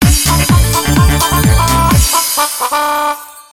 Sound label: free spin init; Diamond Cats